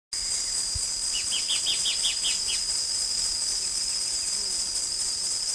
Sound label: Cnemotriccus fuscatus